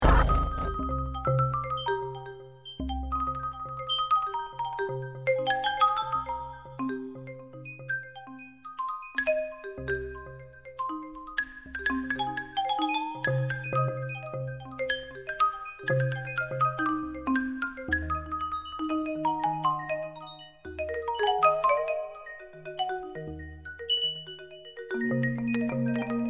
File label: rimbarimba